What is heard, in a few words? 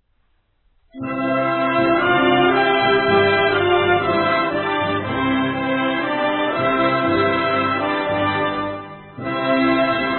European Anthem; Occupied Cyprus